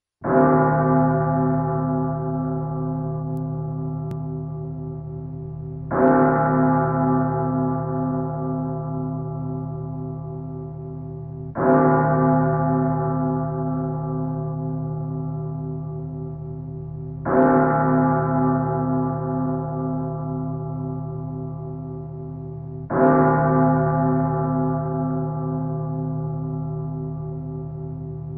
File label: Ominous Bells of Doom